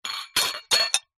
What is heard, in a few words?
wine glasses x3 pick up one handed clink; household